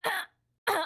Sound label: female death, human